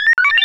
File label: sphere blips md; npc; Portal 2